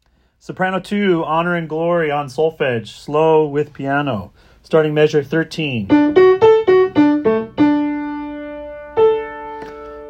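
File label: Honor and Glory Soprano 2 Solfege slow; Wando Choir Class Placements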